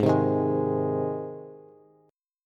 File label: G sharp Major G sharp Bass, chord; strummed; JGuitar